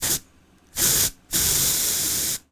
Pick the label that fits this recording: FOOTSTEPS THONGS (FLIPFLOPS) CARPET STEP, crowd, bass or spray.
spray